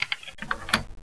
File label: latch unlocked; buttons